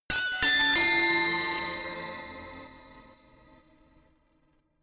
notification glockenspiel crazy triad up diminished; instrumente